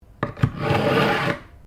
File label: KNIFE SHARPENER USE; Various knives; Kitchen (Sound Effects:Household)